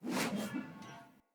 Great Sword Whoosh Accent Air Spin; Weapons; Player; Mendicant Great Sword

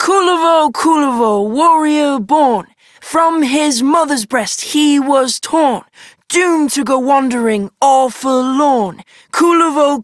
Child M, Childrens Rhymes; Warframe; Duviri; Kullervo

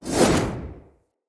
Long Sword Whoosh; Weapons; Mother

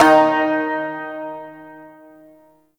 Shawzin Oct One D Sharp; Warframe

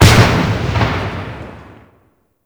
Firework; Warframe; Fireworks

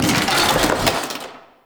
Chair Destroy Misc; Warframe; Entrati; Ambience

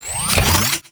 Plasmor Equip, Shotgun; Arca Plasmor; Warframe; Weapons; Corpus

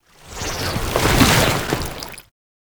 Pool Noodle Heavy Attack, melee

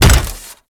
Step; Warframe; Corpus; Arachnoid Wraith; Steps

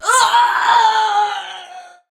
Death; Warframe; Tau; Dax; Ninja

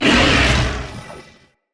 Corrupted Battle Dog Attack Charge; Animals; Vocals

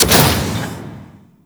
Forest Piston; Props; Warframe; Grineer Forest

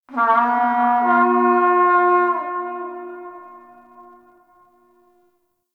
Battle Trumpet Horn Call; Warframe; Tau

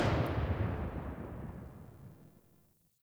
Turret Loop End; weapons; Lasrian APC; Warframe

